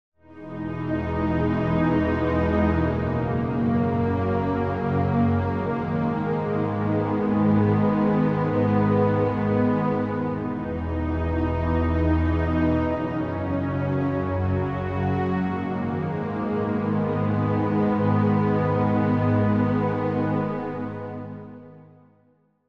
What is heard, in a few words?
Pad; Solstice; Routed Sound; Vital Expansion - Flame Summoner Vol.1